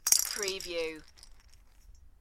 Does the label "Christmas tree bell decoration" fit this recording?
Yes